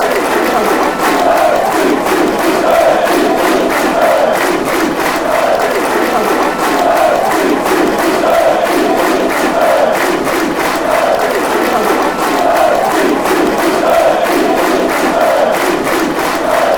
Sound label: fast version, chants